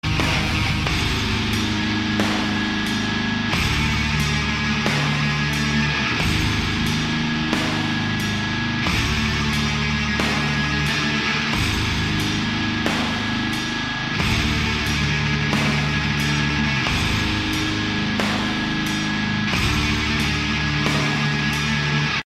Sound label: metal riff